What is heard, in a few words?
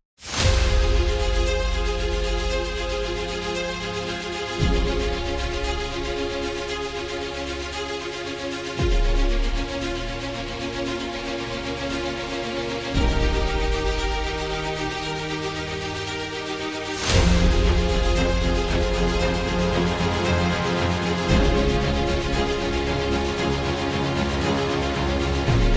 Epic Hero